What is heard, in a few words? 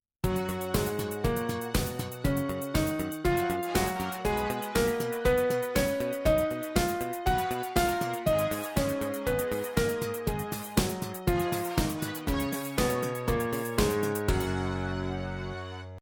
F Major Scale